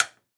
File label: Light Switch On